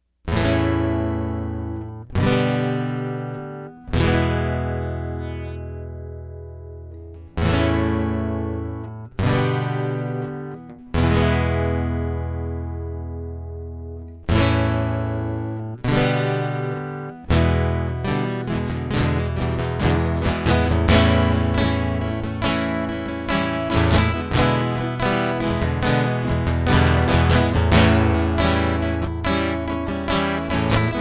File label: Prelude Loop; hyper terrestrial